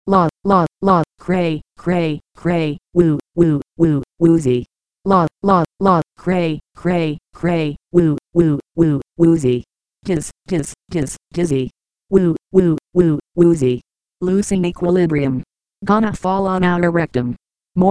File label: Woozy Voice Synthesizer